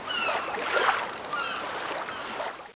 wharf; Caesar3

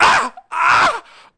WELDER CRAWLING; Messiah

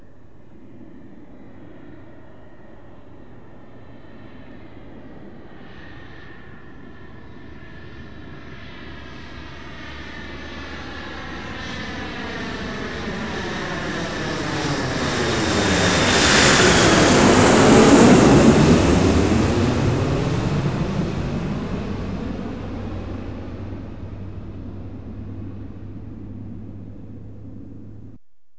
FLYBY 747

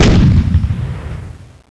mine

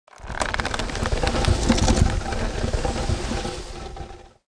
sledge terrain; Immortal

